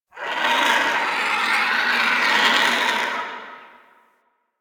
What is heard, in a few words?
Dungeon Island Vultures, Ambience; Warframe; Duviri